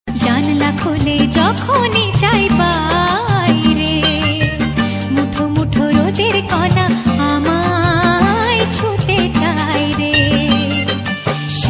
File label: bengali ringtone